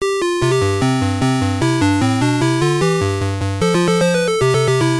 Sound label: Game Start; popeye